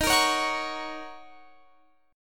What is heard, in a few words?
D sharp Minor 7th, Guitar, Open F; strummed